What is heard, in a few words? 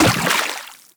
step water, footsteps; Warframe; Teshin